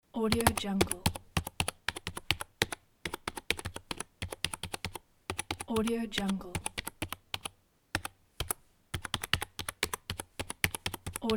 keyboard

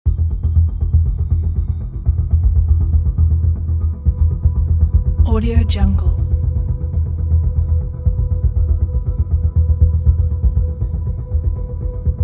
mystic soundscapes

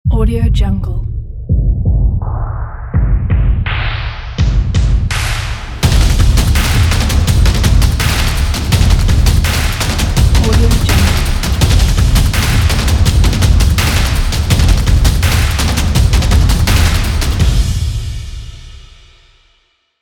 soccer logo

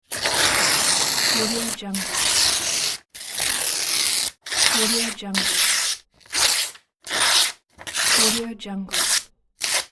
tearing paper

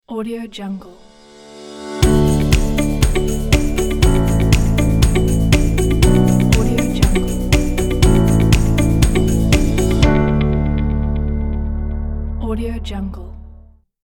inspiring corporate logo

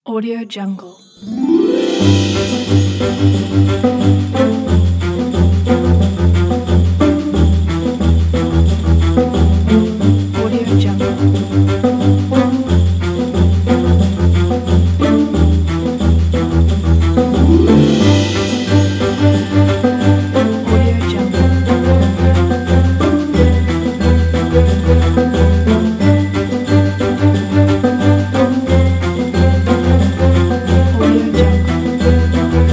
hurry santa

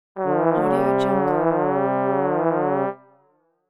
funny cartoon trombone sfx

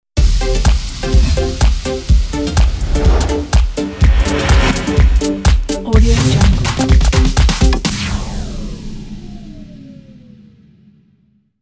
deep house fashion logo

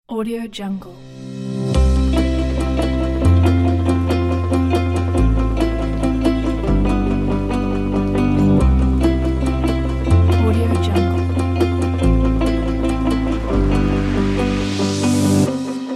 uplifting corporate; rocketfactory

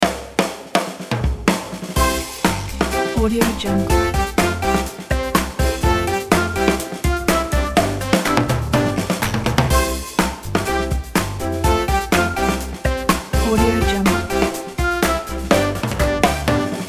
kids game show opener; waderman

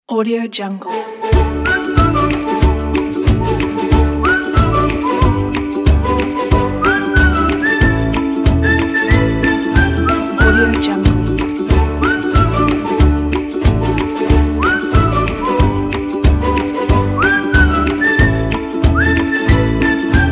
jingle bells whistle

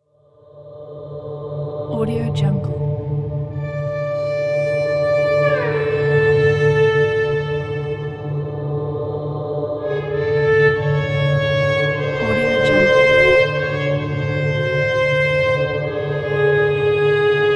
dark mystery

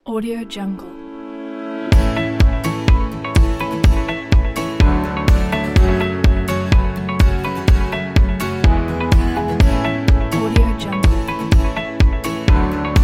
motivate music; freefallmusic

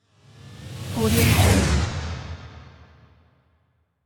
metallic spaceship whoosh; hollywoodedge

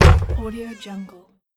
castle wood door open

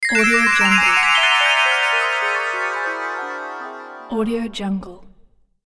magic disappear

